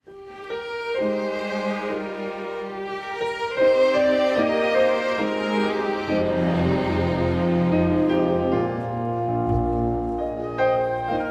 Hymn, instrumental, Come, thou fount of every blessing, Nettleton